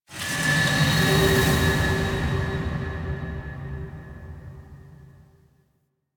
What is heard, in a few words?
Factory Thud; Ambience; Warframe; The New War; Quests; Zariman